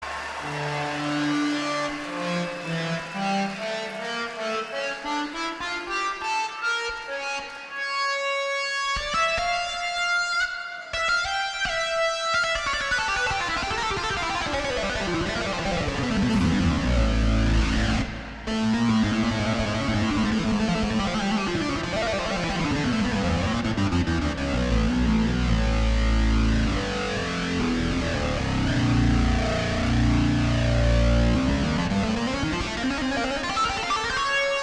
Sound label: keyboard solo